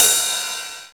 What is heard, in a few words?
DR. LOOP, DRUMS; X-Static Goldmine 3